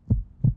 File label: heartbeat; firefight